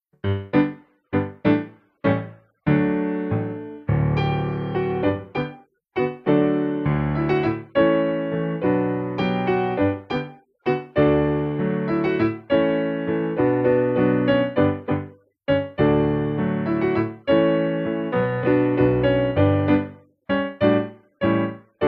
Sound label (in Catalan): dansa; fondues